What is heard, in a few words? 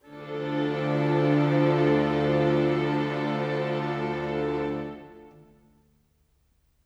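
Pad D minor, Orchestral; Sonic Foundry (Sony Creative Software); Orchestral VOL-2; Pads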